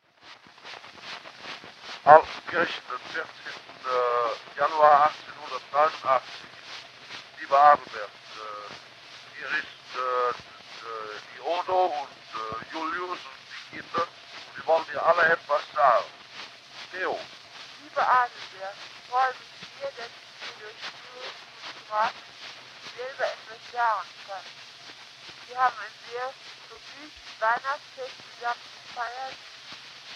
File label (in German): Tonaufnahme 1890